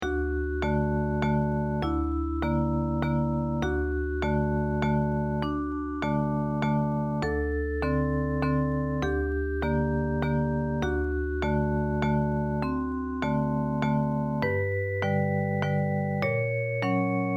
simple waltz

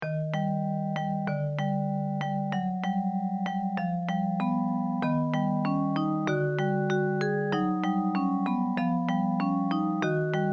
folk song of kyoto; iwaya odori

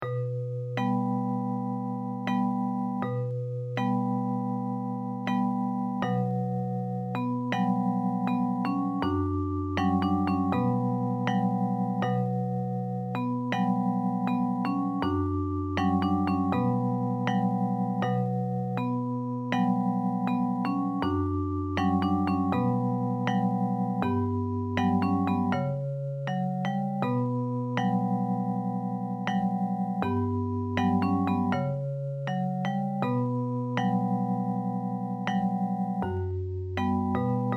japanese folk song; pirika pirika

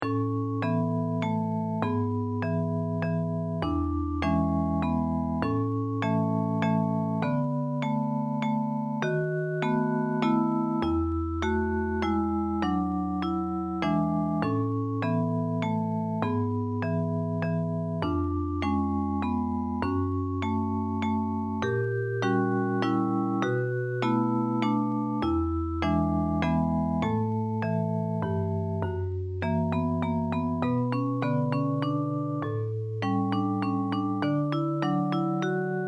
classical waltz

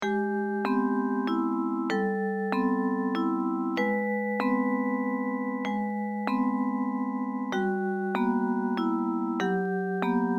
simple waltz

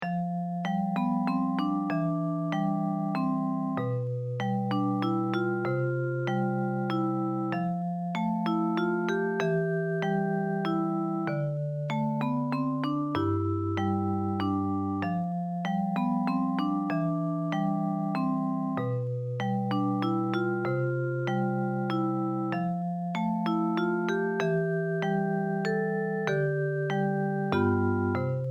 short melodies waltz style melody

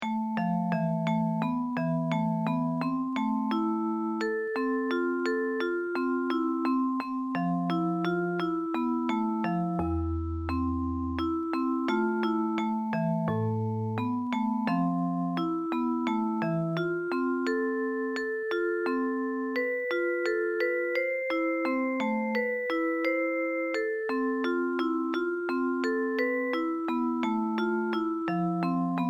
japanese folk song; anekomosa

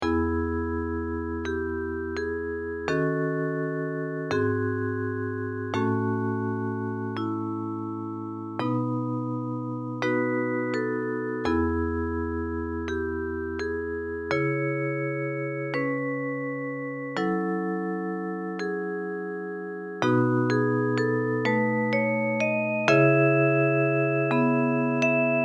choral

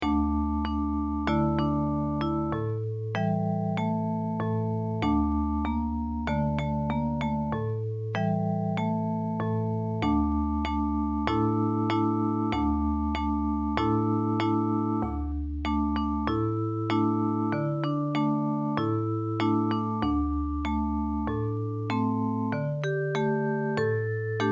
japanese folk song; shimotsui bushi

